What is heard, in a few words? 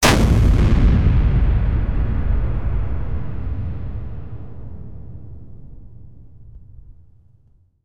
a gas tank explosion; destruction